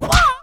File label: Hero Monk Multihit scream